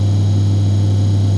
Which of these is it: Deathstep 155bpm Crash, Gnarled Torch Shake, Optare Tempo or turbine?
turbine